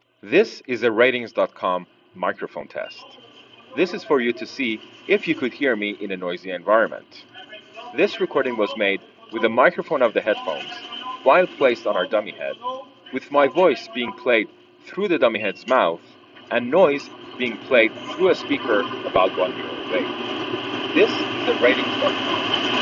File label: internal mic subway